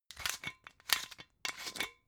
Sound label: glass bottle massive open scratch